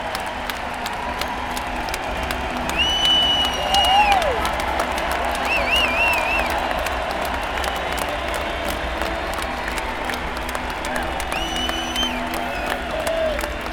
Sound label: Hockey Arena Pregame Applause